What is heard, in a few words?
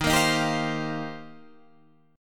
Eb 6th Add 9th, Guitar, Open D